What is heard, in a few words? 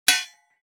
Cartoon accent crash broken metal object